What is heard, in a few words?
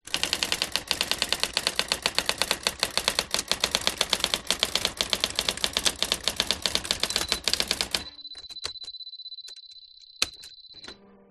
electronic typewriter typing fast sequence brother ax 450; office